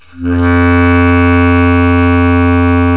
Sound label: Clar; ltfat